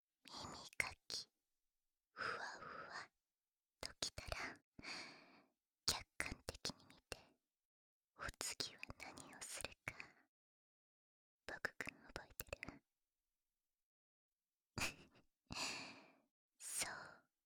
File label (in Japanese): ≪暖かい≫耳ふ～（膝枕FXなし）; 中学生篇; 耳ふ～各種差分